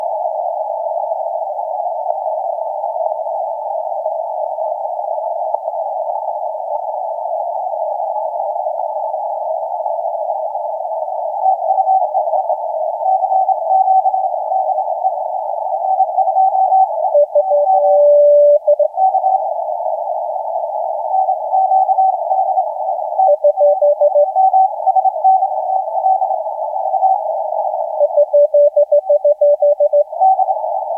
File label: 20m CW